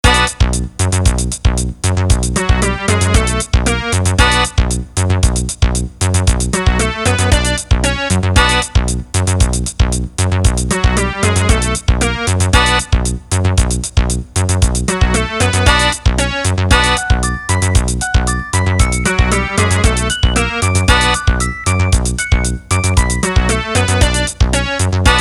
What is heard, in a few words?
electric bleep blip; trombone; TromboneWarlock III